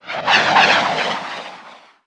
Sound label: 360 spin; TrickStyle